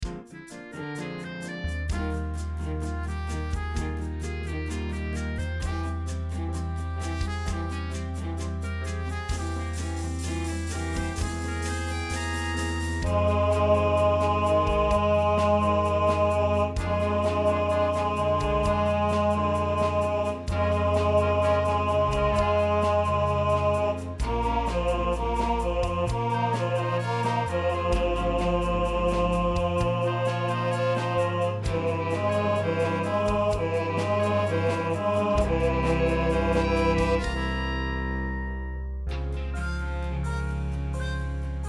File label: Baritone 2nd Part, rehearsal